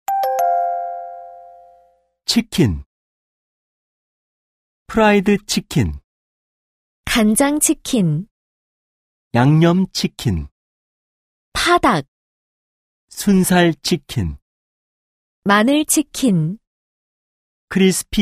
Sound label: K-POP